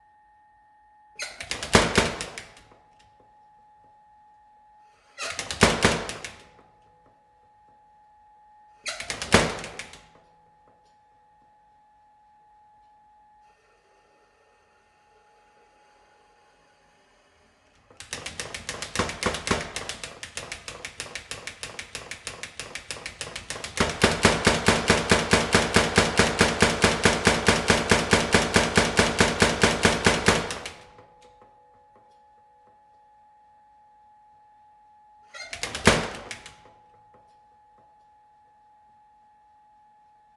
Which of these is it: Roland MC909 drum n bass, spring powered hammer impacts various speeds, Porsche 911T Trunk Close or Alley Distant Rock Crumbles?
spring powered hammer impacts various speeds